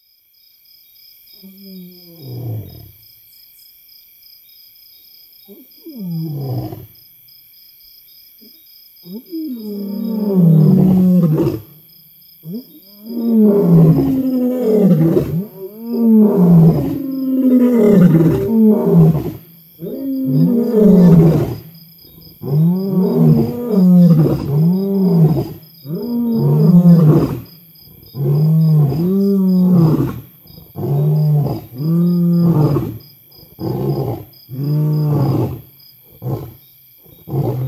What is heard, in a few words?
Roar